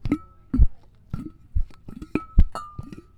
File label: Cork Lid; BB Retail Therapy Sample Pack